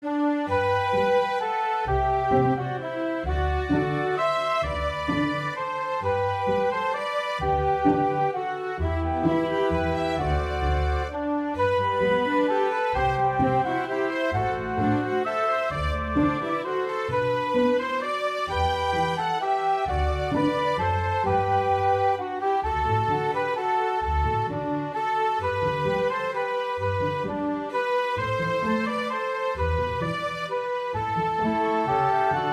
Waltz Tunes

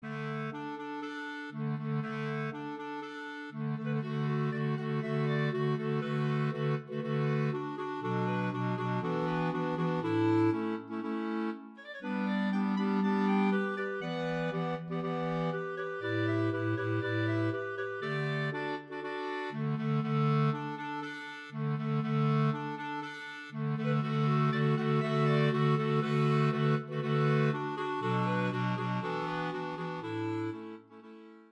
Clarinet Quintet; Wedding Day at Troldhaugen

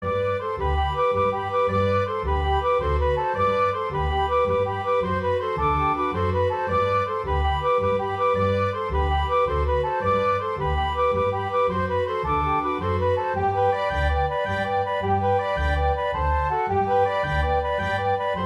Four Slip Jigs

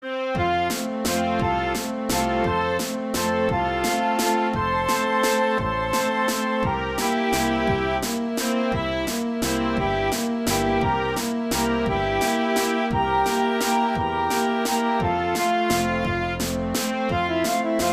Chamber music

